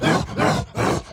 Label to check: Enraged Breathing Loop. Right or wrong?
Right